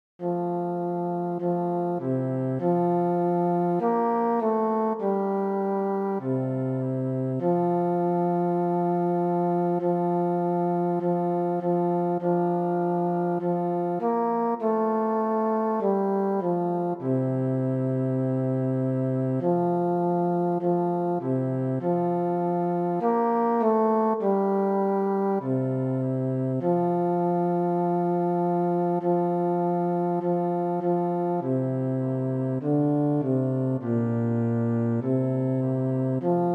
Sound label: bass